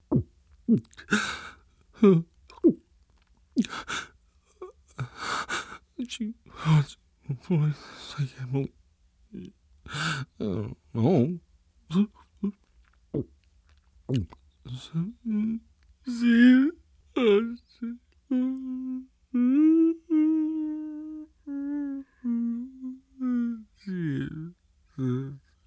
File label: drinking